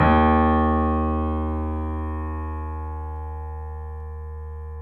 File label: Small Pianos; E-MU Producer Series Vol. 5; 3D Multis